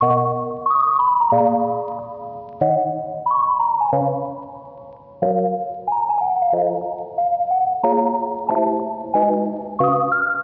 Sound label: Lofi Melody Loop - 92 BPM F# Maj; Melodics; Cymatics - Eternity Sample Pack